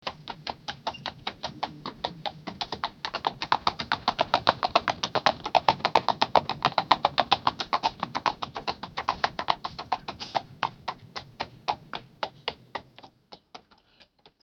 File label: horse throws me off the